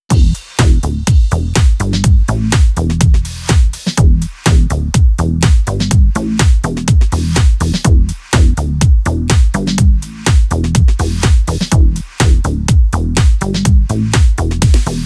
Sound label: house electronic techno; By Burak Sound Bank; house electronic techno vol 4